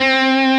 solo Left-hand vibrato

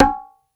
bongos high slap

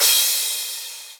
cymbal; osu

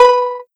soft hit whistle; osu

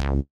C#3 bass

